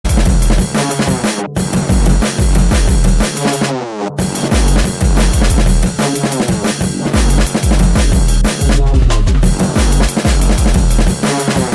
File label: Drum and Bass Jungle, UK Bass Radio